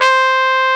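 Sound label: trumpet, SC55 brass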